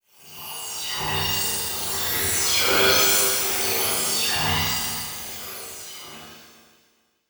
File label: Transition Digital Scanner; Production Element Title Transition